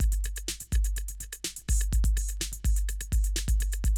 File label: Classic DrumLoops, 125